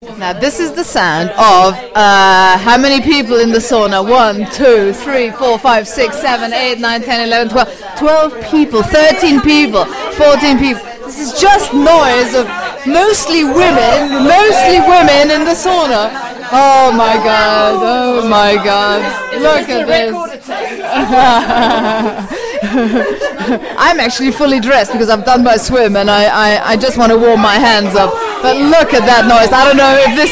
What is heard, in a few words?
sauna noises; lido crawl